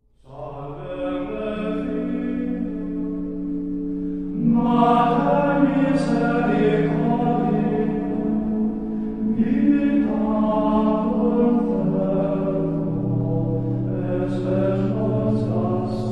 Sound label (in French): SALVE REGINA, chant; Chants à la Vierge Marie